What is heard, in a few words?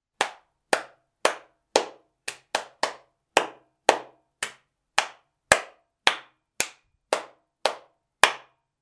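palms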